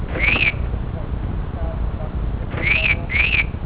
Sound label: siren old, alarm siren